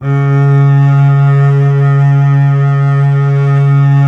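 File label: string contrabass C; Miroslav Vitous; Solo Instruments VOL-2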